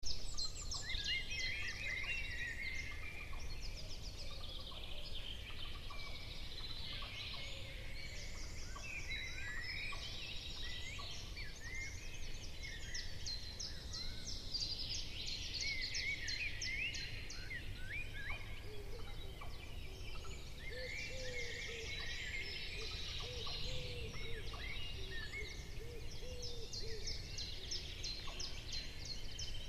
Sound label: mechanical sound